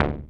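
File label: synth, 140 BPM, Drum & Bass; Ueberschall; Drum & Bass Resonance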